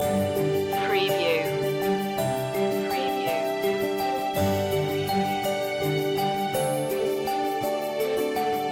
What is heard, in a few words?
christmas morning loop